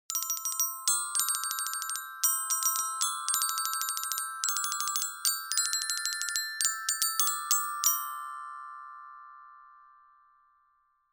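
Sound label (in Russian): перкуссия с рождественской темой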